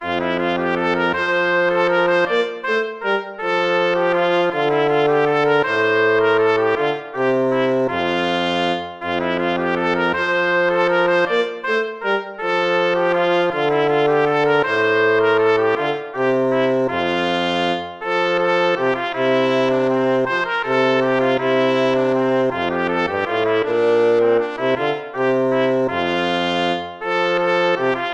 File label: lute